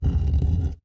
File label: Block Slide